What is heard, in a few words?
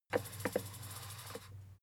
fader plastic stutter soft